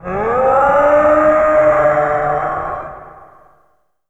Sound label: Dinosaur